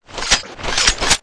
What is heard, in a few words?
beamgun charge shoot